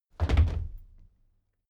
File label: step military march stop